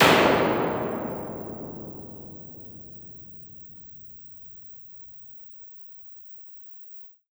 EMT 140 Plate

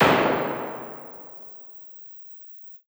EMT 140 dark, Plate; IR

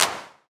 clap, TR-909; Detroit

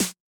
snare, TR-909; Detroit

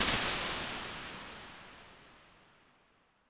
large bottle hall; IR

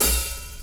hihat open; drums; pearl master studio

